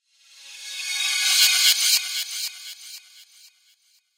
Swell, Reverse, Shimmer, Eerie, Horror, Transition; Production Element, Imaging Element, Accent; BlastWave